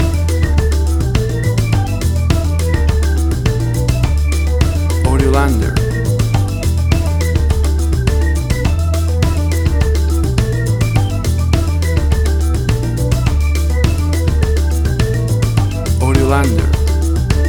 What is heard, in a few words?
Bossa Nova Latin Acid Jazz Music